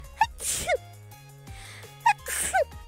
Sneeze

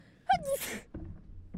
Sneeze

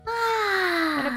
aaaaaaaaaaa

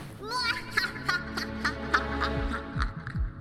muahahahahahaha

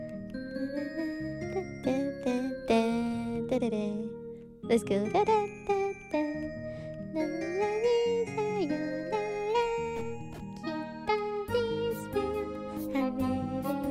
Singing; CoD BGM; IRySinging

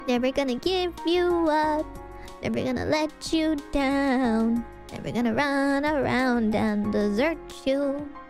Singing; IRySinging

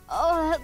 oooh

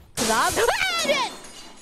Scream; IRyScream

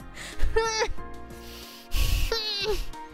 tsundere noises

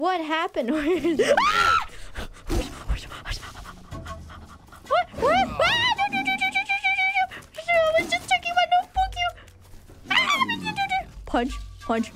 Scream getting attacked; IRyScream

